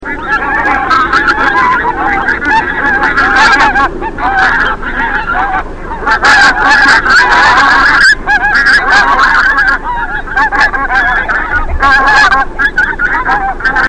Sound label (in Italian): Oca zamperosee